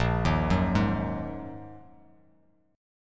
A sharp 7th Suspended 4th Sharp 5th, down, Bass Standard; arpeggiated; chord; JGuitar